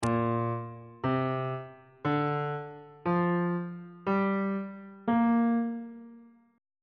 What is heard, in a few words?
a sharp major pentatonic scale